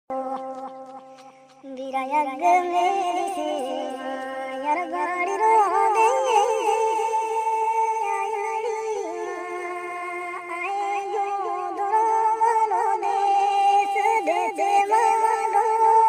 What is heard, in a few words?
Ringtone